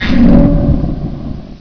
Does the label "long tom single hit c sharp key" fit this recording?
No